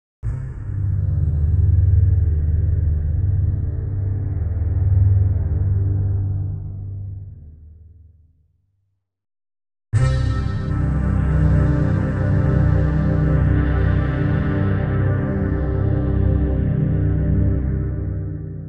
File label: Orchestra Voice C1 C2 C3 C4 C5; Klaus Schulze Collection